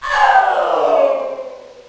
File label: wierd